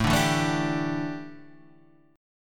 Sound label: A 7th Suspended 4th, A, down, Guitar, Half Step Down; A7sus4